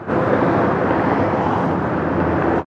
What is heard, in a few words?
air outside; Takeshi and Hiroshi